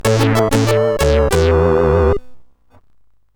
Jingles, sid CSG8580R5

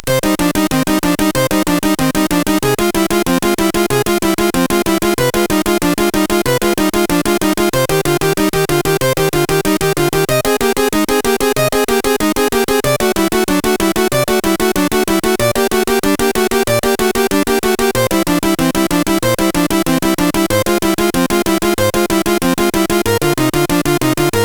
Prelude, sid CSG8580R5; hvsc

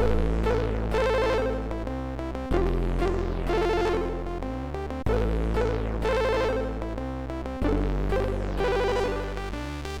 Kirmestechno, MOS6581R3

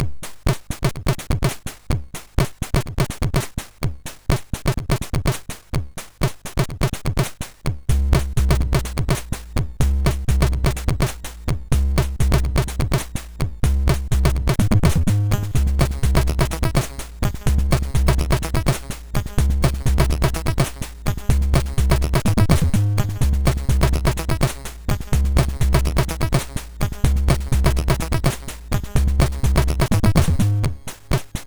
Chill, sid MOS6581R2

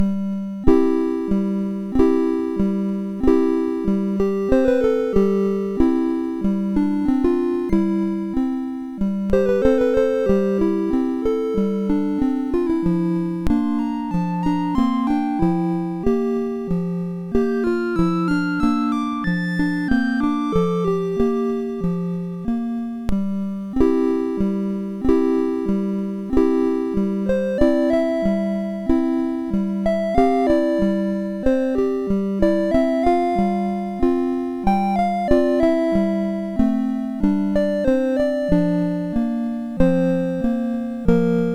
Acoustic, MOS6581R2

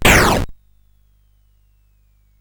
Frantic, CSG8580R5

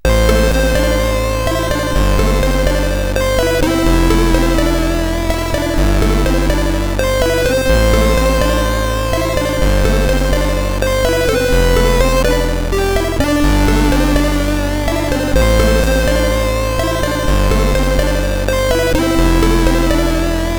Sniff, CSG8580R5

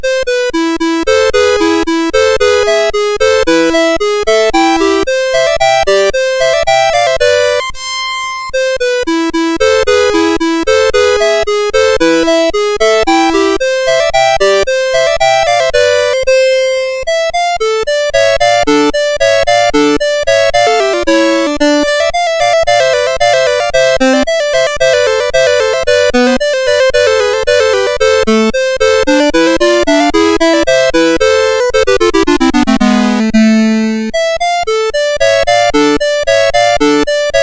Allemande, MOS6581R4

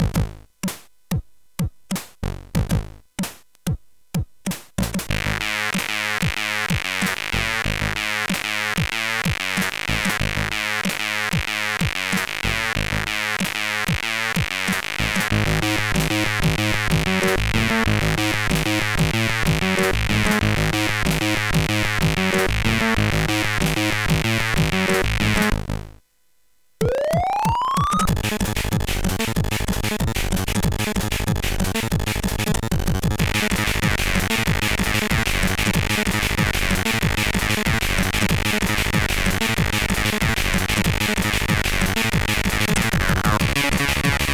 White Noise, sid, CSG8580R5

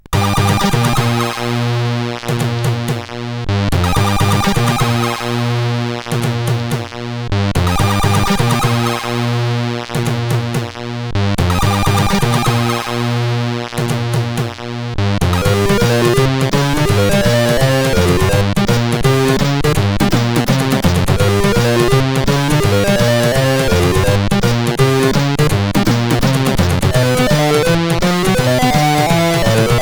CSG8580R5

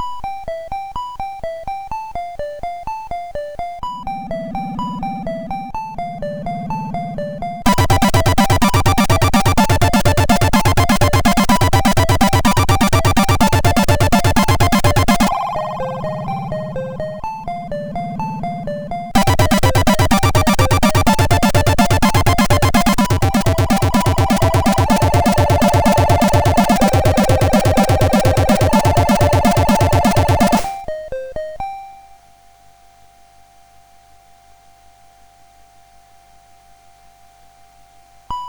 Intro Tune sid MOS6581R2

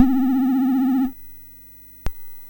Mini Golf, MOS6581R4

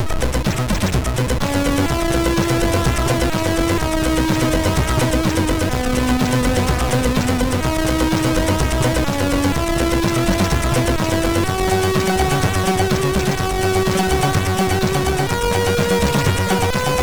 sid MOS6581

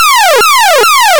sid CSG8580R5, GAMES; Android 2

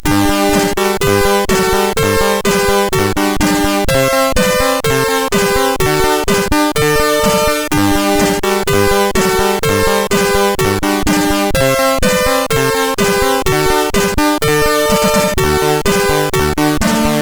German Anthem, sid, MOS6581R4; Wijnhoven Joachim

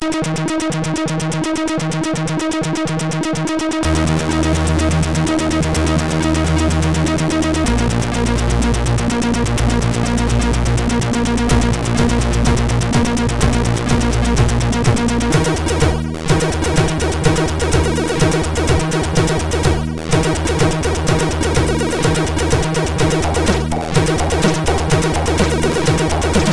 tune sid MOS6581R4; Siebold Markus

